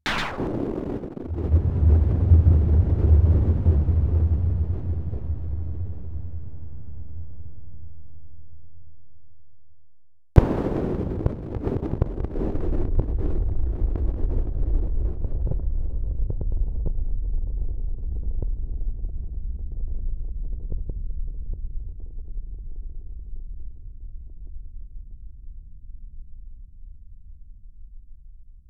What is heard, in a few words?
thunder